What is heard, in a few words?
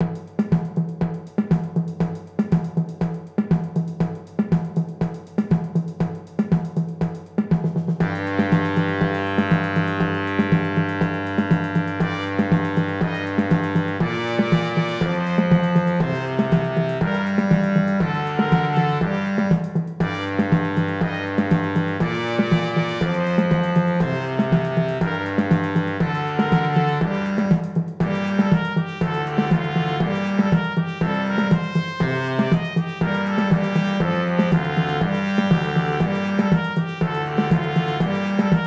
samba tuba